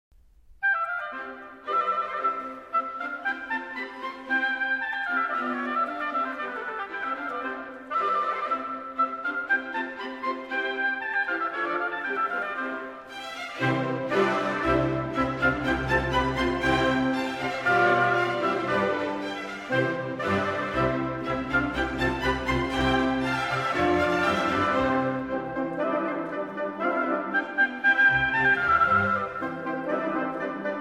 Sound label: mozart wind